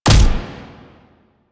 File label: Fulmin Prime Punch, Weapons; Warframe